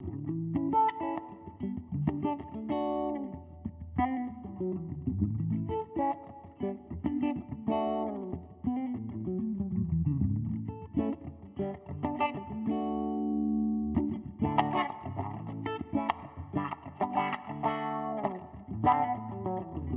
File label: Bass Mod; 18V